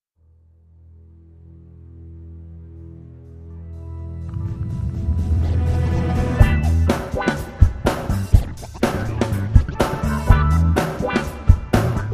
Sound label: funk